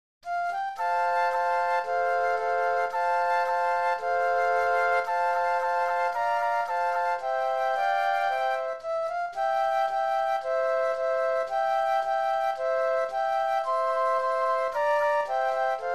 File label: Flute Trio